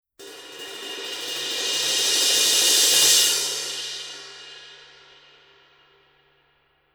masters 19 dark crash roll, paiste

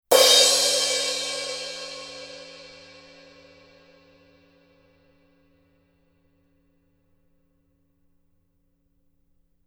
900 series 16 heavy crash edge; paiste

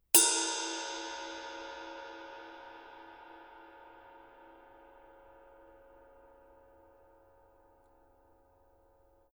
22 black heavy ride body, paiste; color sound 900

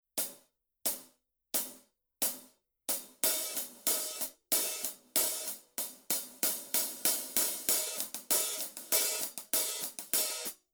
900 series 15 heavy hi hat pattern, paiste

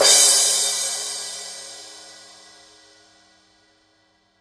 16 crash edge, paiste 2002

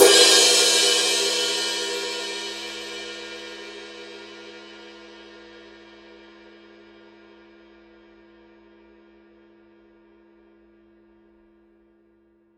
20 power ride edge, paiste 2002

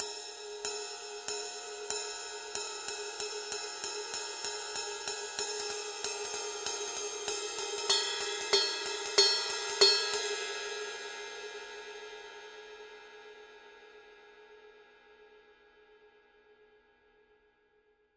20 ride pattern, paiste 2002

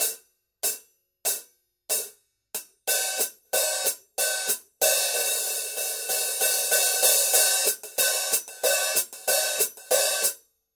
13 sound edge hi-hat pattern, paiste 2002

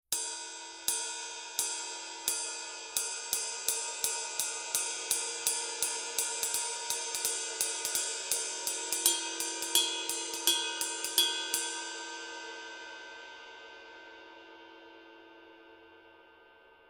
900 series 22 heavy ride pattern, paiste

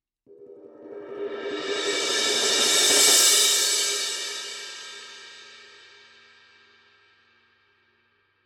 formula 602 classic sounds 20 paperthin roll; paiste

